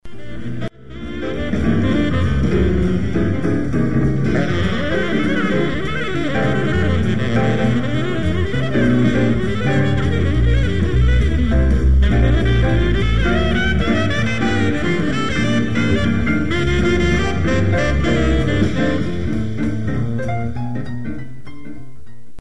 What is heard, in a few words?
Blues Tenor, jazz combo